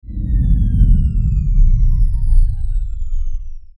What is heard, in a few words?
Descend Engine Power Down; Descending Sound Effects; Blastwave